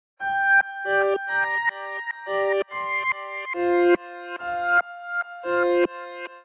Bouncy (Cmin) 144bpm Pad Chorus; Ableton; Factory Packs; Samplification

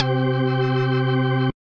Ghost Organ, Synth Keys; Ableton; Glitch and Wash

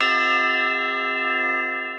Crystal Pyramid, Mallets; Majestic Mallets; Multisampled

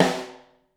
Snare Overhead

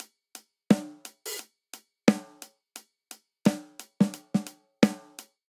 Rolling Hip Hop Snare & Hihat 87 bpm; 4 4; MIDI Clips; Ableton; Factory Packs; Drum Booth

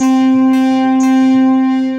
Rhythm and Cello, Retro Synths; Ableton; Factory Packs; Vector; Vector Patches

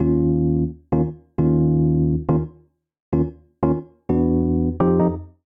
Eb Minor 88 bpm; MIDI Clips; Tonal; Accompaniment; Rcrd Plnt; Ableton; Factory Packs; Chop and Swing

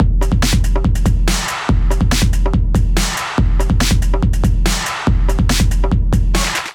Kit 142 bpm, Drums; MIDI Clips; Fabrik Kit; Ableton; Factory Packs; Skitter and Step

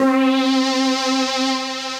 Synth Lead; Moon Lights

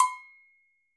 Agogo E Low, Latin Percussion; Drum Hits; Bell; Ableton; Factory Packs

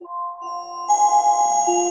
Bell; Bell Freezes; Inspired by Nature by Dillon Bastan; Emit Presets

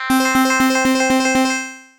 Chimes; Ableton; Retro Synths; Vector; Vector Wave Sequences

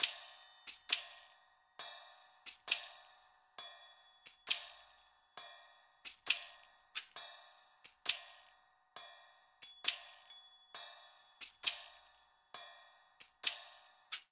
Ballad Cymbals & Shakers 67bpm, Latin Percussion; Ableton; Factory Packs

